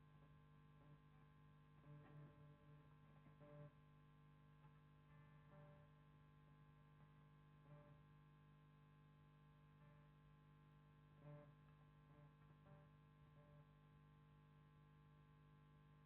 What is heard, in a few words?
GTR